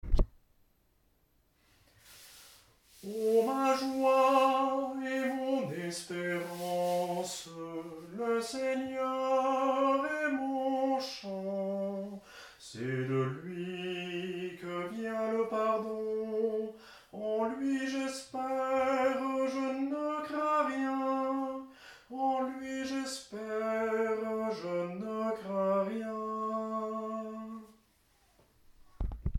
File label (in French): TENOR, chant a plusieurs voix; atelier de chant a plusieurs voix